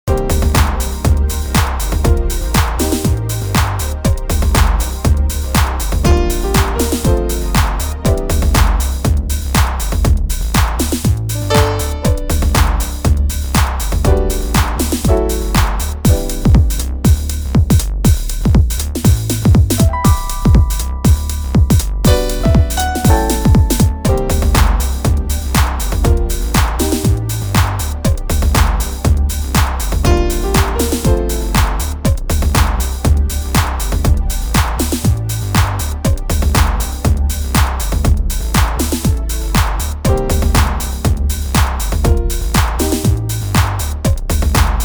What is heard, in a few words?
House Seq TR909 Piano SineBass Sub Strings